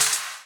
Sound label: Clap; Vengeance Samples